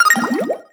SFX Get Ink Bottle; Alterna SFX

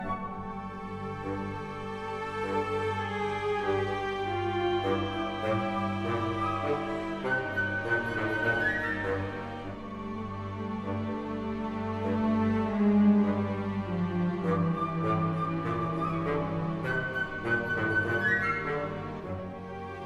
Music Dialogue Suspense 50bpm Loop

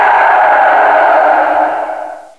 Scream; Ricochet

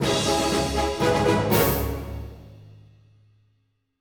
Music Fight Theme Average 120bpm End; asterix obelix slap them all 2; Romans

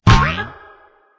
Clicker game tap pumpkin

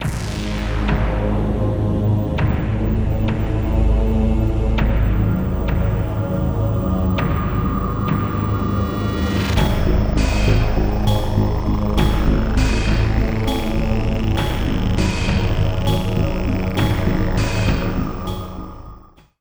Mission Failed; Chrome SpecForce; windows gamerip 2005

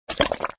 Bleed; Cooking Mama

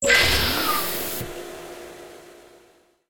Air Projection; Puddle

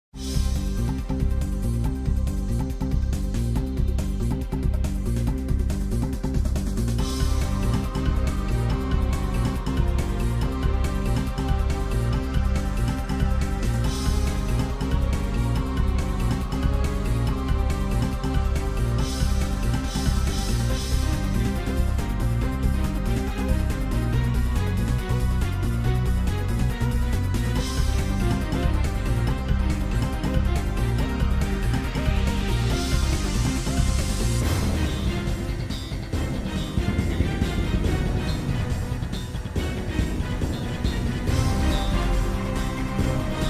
Dramatic

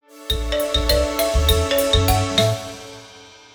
Level start; mermaid adventures the frozen time

